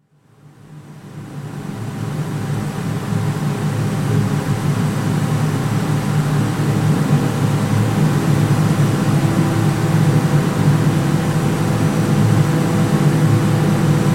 Stainless Steel Making, gas fired ladle pre-heater; Hand Tool Sound Effects; BBC